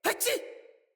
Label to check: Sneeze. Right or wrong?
Right